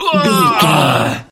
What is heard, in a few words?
voice male death